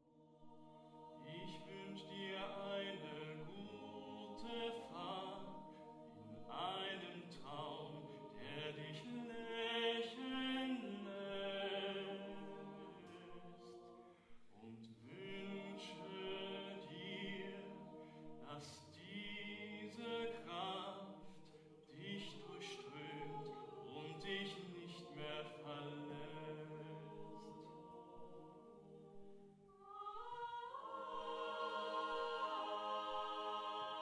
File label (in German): Schlaflied